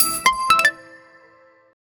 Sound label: win jingle sparkly pop